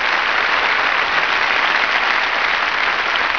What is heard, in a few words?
Applause loop; Dabbler